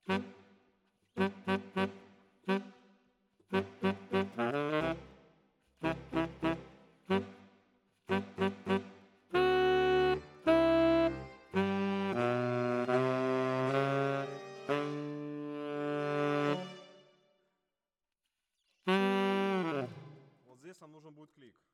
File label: Tenor, RNR for piano